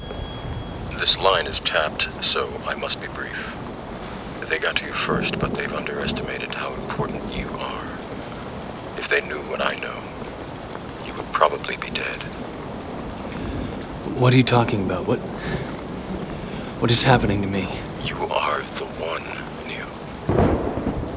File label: tapped